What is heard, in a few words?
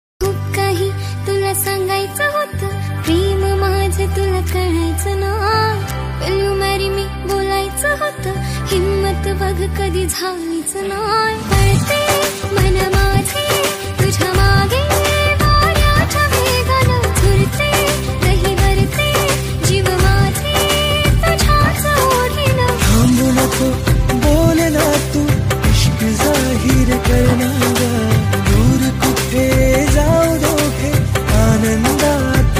Marathi Ringtone